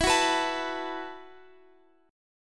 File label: E Suspended 2nd Suspended 4th E, down, Guitar, Open F; Esus2sus4; strummed